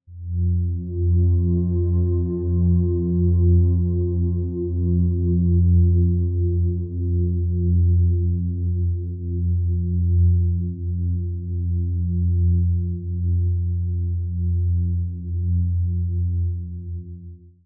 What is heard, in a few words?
Door; Game Concept